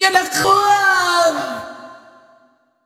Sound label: Vocal Phrases; Vengeance Samples; Vocals